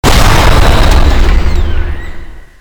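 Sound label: jump explosion, weapons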